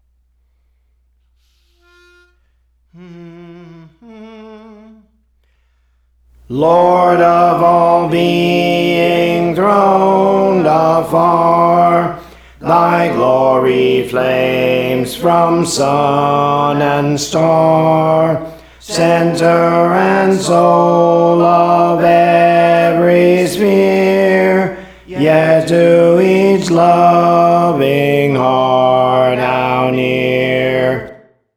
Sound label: Alto, Songs; Praise For The Lord Training; Lord of All Being Throned Afar